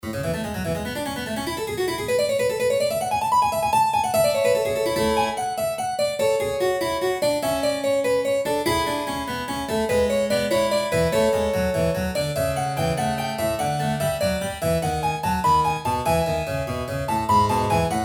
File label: harp